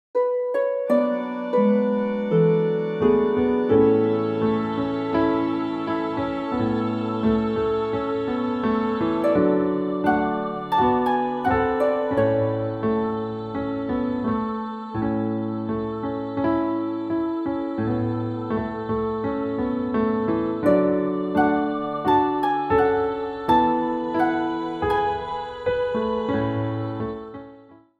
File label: BACKGROUND MUSIC